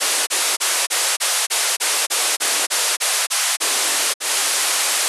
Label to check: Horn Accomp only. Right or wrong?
Wrong